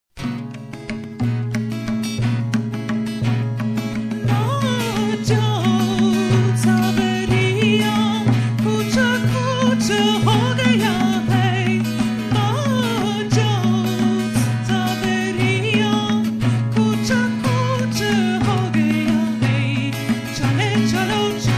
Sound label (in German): indisches lied